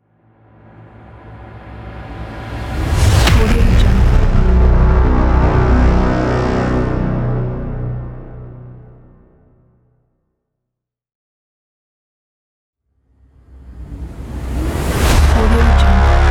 braam hits riser

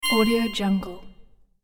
tiny chime shine

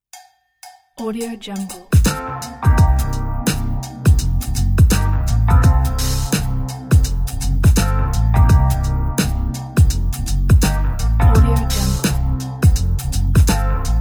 funky groove

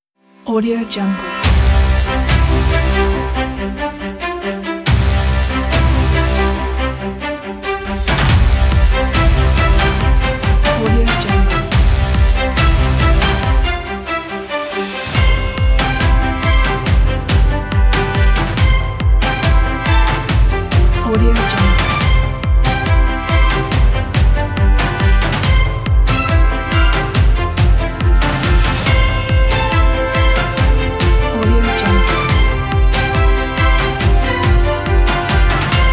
the celebration

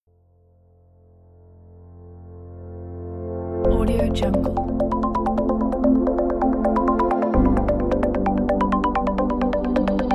news logo

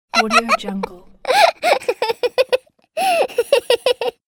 child funny laughing

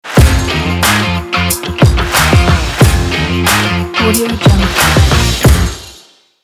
podcast opener logo

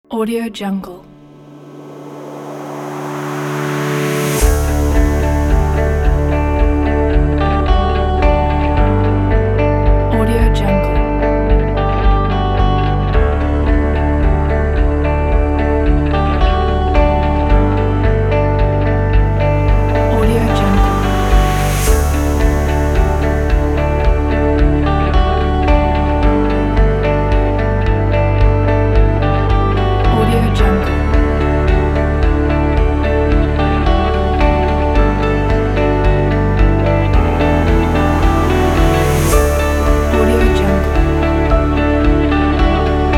happy imagine ambient inspiring interview; abc stocksound

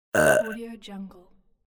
burp

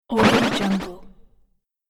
speedy jump

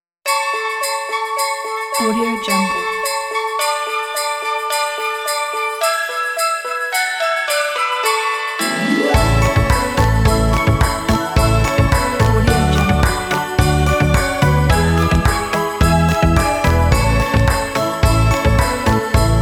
jingle bells

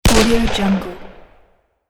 45 long colt revolver single shot; sound ideas

